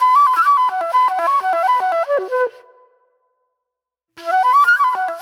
Soul-Jazz Flute, Call And Response

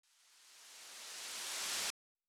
Drum Loop 128BPM Riser; VEC5 Drumloops; VEC5 Multi Loops 128BPM; Loop Elements

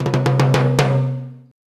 TIMBALES; Zero G - Ethnic

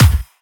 Dubstep Kicks

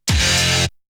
Guitar; ZERO G - Datafile DISC 1

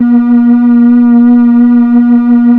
3100 A#4, Korg PS3100; Back In Time Records; Korg Universe VOL-1

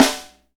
SD Real, Roland; Sound & Vision - Gigapack I

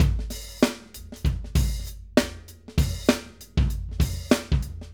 Acoustic Live Drum

